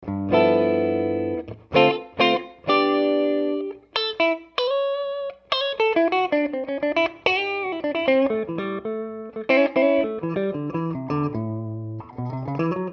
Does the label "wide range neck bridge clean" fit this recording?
Yes